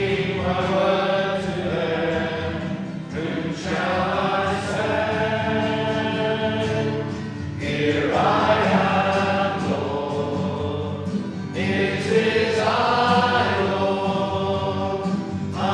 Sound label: singing at partnership for missional church meeting in bristol